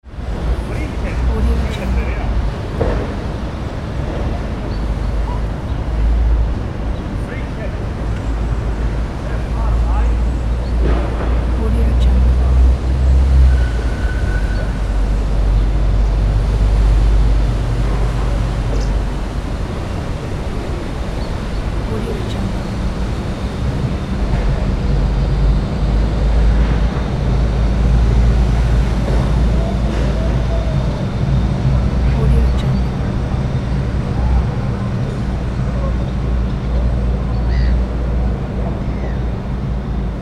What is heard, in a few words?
city ambience busy street